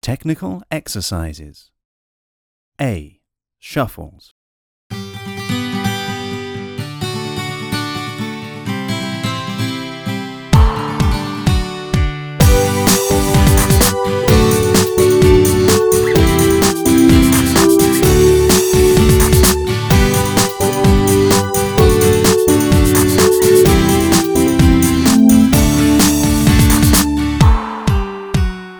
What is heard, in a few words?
Preparation for Shuffles, exam music